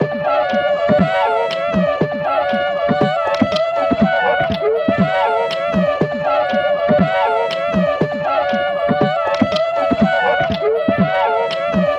vocal loop grime; Polyend

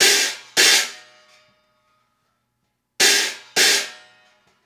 Hi Hat Pattern

